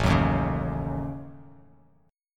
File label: A 6th Flat 5th, Bass Standard, A6b5 chord; strummed; JGuitar